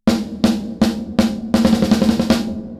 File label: TREBLE CONTOURING Roll off 500 Hz